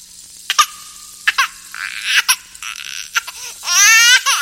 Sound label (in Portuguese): choro de beb